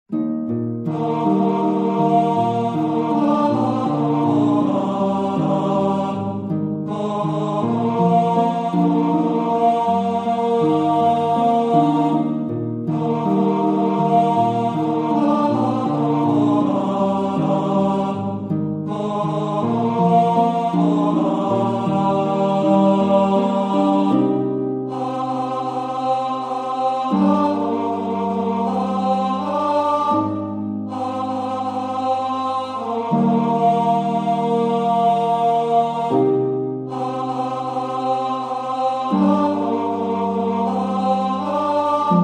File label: Tenor